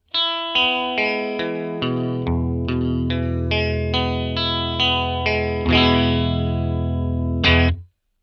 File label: jag both, vintage and reissue jaguars; sound samples of vintage and reissue jaguars and jazzmasters